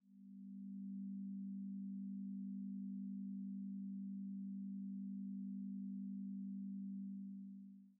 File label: crowd, ambience; two-rivers